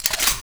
sniper bolt; svencoop

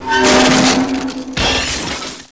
bridge crash

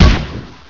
mine launch

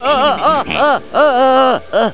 aaaa

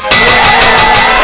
bell

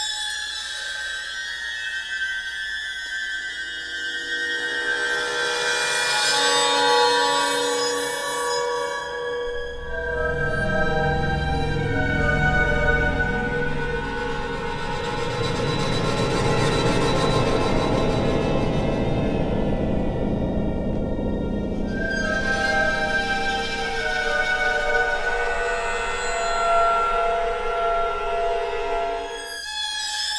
tense; svencoop

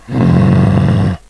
hit; brainbread

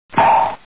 hit

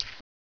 raptor footstep

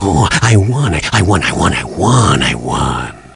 Cheer; svencoop; voice commands

